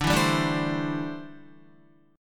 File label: D 7th Suspended 2nd Suspended 4th D, Guitar; D7sus2sus4; strummed